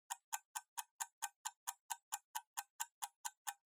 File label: Kitchen Timer Tick Short